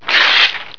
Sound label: paper tearing